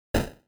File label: mage hit; Incantation